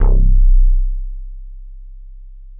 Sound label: SUB SQ; Masterbits; Vintage Voltage; SQ+FX